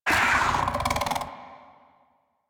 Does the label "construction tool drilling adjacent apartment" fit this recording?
No